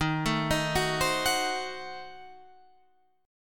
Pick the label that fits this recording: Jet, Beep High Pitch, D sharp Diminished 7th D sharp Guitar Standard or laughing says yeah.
D sharp Diminished 7th D sharp Guitar Standard